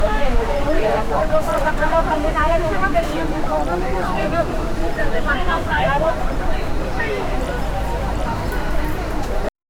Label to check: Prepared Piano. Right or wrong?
Wrong